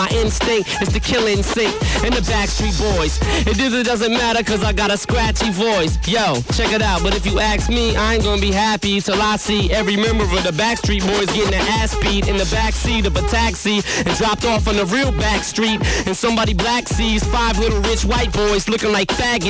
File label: hiphop